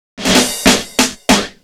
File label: Fill, Snare Fills; Drums